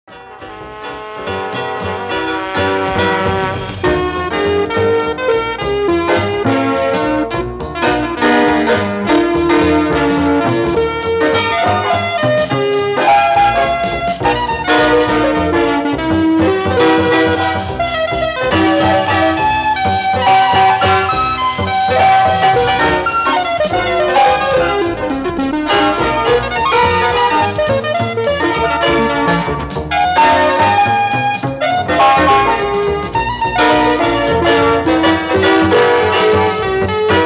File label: Greensleeves keys